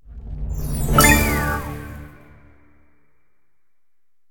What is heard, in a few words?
candy Win Reveal High; fairyhansel